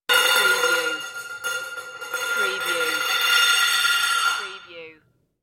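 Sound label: Metal Lid Spins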